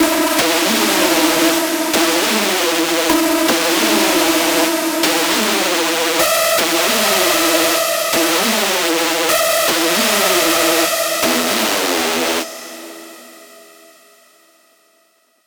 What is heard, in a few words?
screech loop E min 155; Music Loops; GhostHack